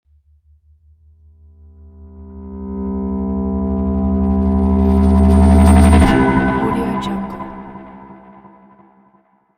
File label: cinematic rise